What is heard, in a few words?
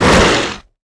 Digger hit; KuberaMine